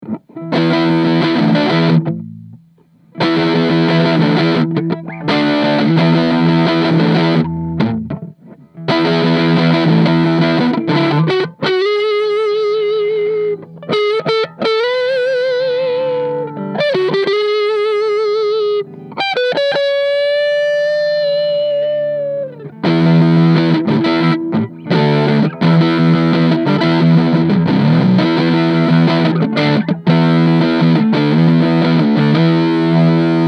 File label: 58 neck dirty, gibson les paul; Gibson Les Paul 1958 Gloss Standard Reissue Tea Burst